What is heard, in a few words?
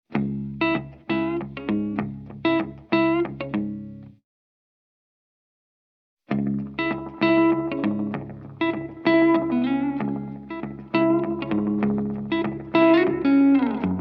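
Vintage Oil Can Echo, A Minimum Time setting in Both Heads mode creates almost Spring-like tones and reverberant echoes. At the end an Expression pedal increases both Mix and Regen.; Change (In The House of Fried Chicken); Olivera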